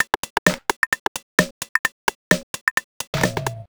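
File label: No bass drum, Drum Loops 130bpm; Zero-G; Soundclash Nu Dance Electro; Acidized Wavs; Snap Crackle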